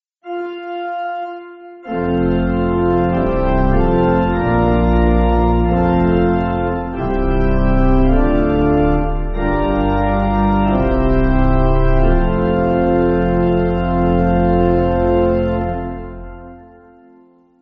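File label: Amen 3fold, Pipe Organ; Chinese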